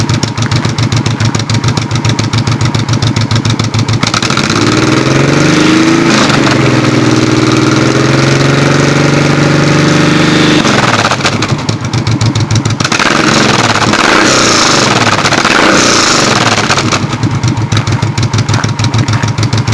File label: VTX1800C Roadhouse Shorty 91-113db, exhaust